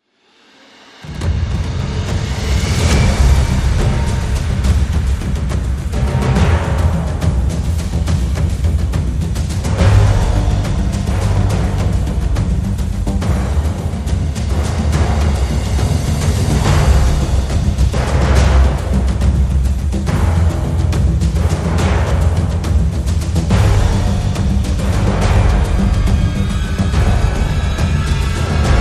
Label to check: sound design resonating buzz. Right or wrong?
Wrong